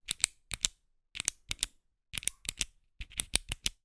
office pen ballpoint press multiple clicks

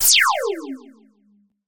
science fiction laser; sound effects one

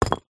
foley brick set down ground; zapsplat

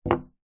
household coaster clay set down on glass table

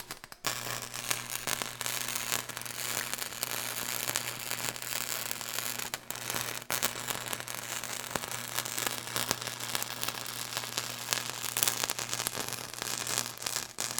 Arch Welder Spark; Blastwave FX

